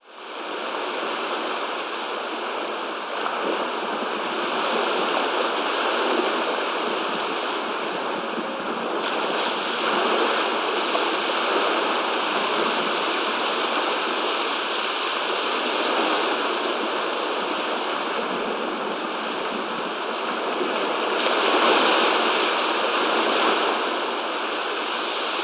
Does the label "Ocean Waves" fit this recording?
Yes